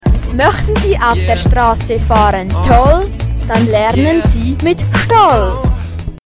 Jingle Fahrschule; stoll Fahrschule

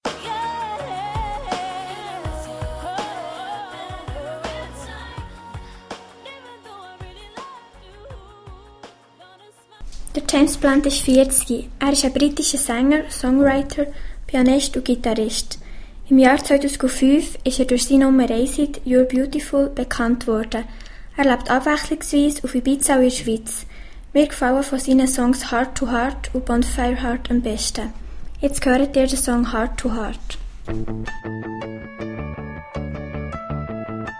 Piano spielen

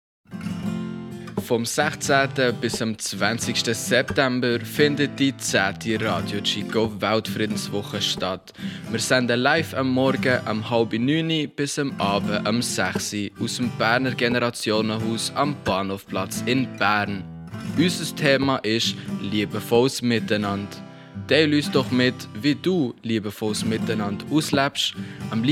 Jingle

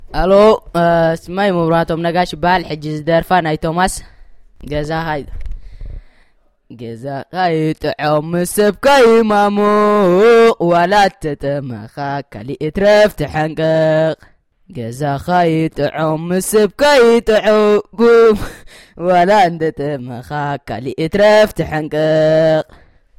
Gesang

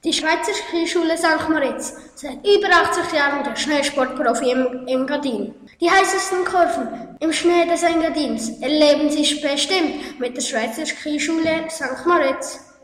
Skischule jingle; Unterseen

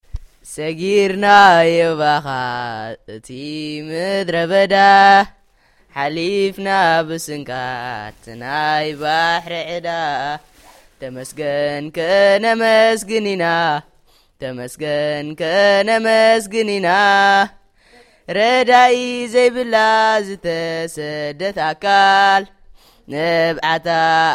Gesang

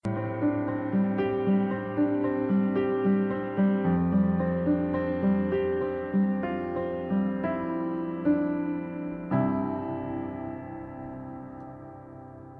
Piano spielen

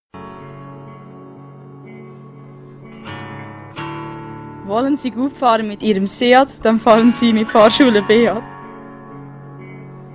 Jingel Fahrschule

